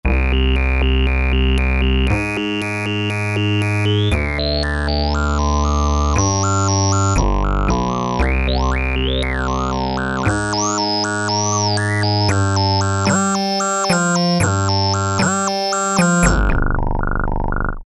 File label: syn, E-mu Orbit V2; Factory presets